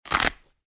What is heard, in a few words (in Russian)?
zvuk hrusta pozvonochnika